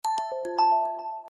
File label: pure sms tone